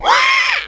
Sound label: pig; animal